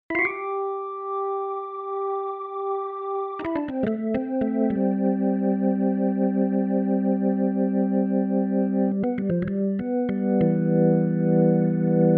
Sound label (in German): Korg Grandstage Orgel Jimmy Organ